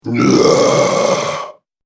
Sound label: Orc Nasty Roar; Monster Sound Effects; horror sound effects